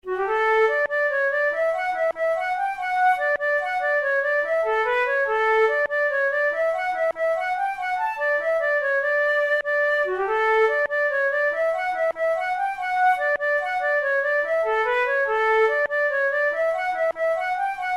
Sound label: jig, flute tunes